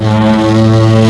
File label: tugboat; Transportation